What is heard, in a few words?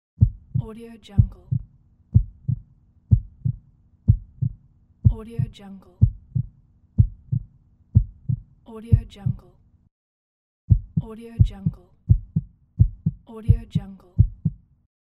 heartbeat; n-audio